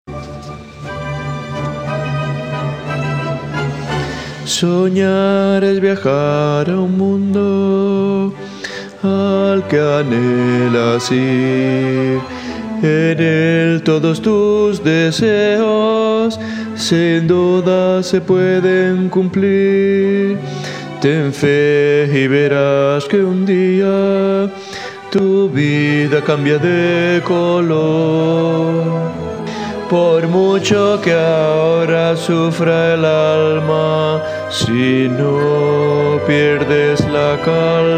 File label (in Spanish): Soñar bajos